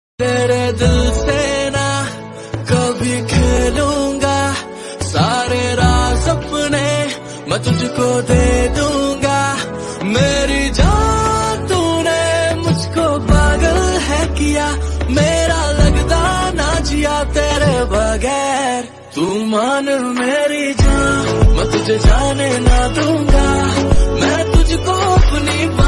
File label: Romantic Ringtone